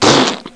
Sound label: splat; Storybook; Ernie the Bat